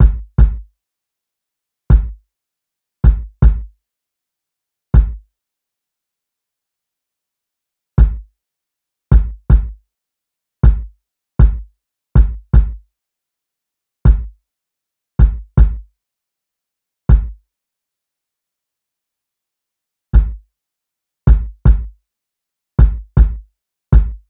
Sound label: kick 79, Kick Loops; drum sample pack; Fuji; SEISMIC; Slate